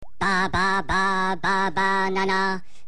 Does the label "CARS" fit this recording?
No